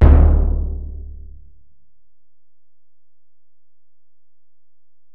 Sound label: Bell, Alesis DM-PRO